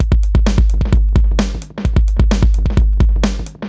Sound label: Space Echo HR-16B 130BPM; musicradar; cyborg beats